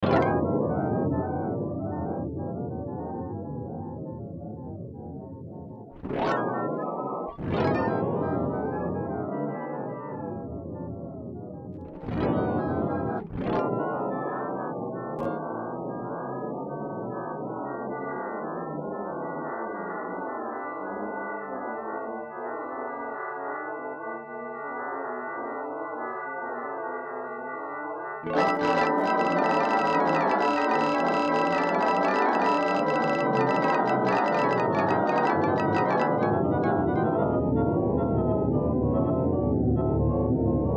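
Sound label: Particle Detune Range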